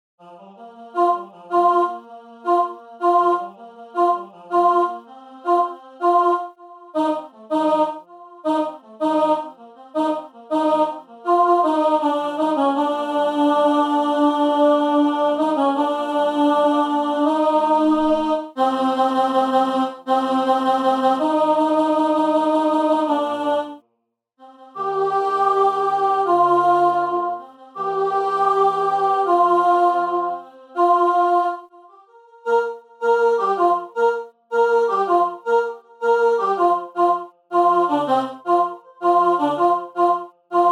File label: alto